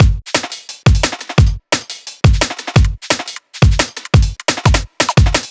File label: 174, Drum Loops; M8; Electronisounds